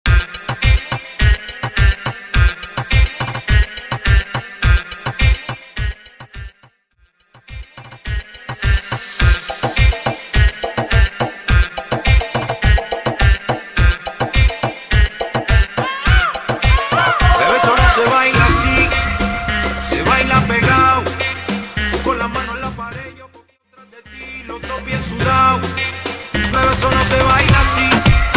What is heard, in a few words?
Intro Outro Dirty 105 BPM Reggaeton